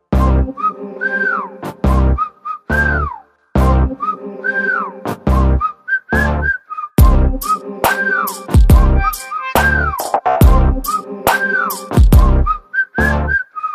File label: Bgm